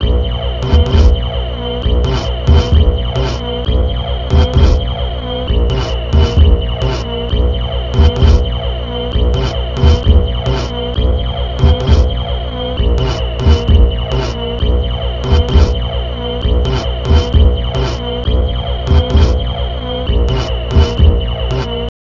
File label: Sinewave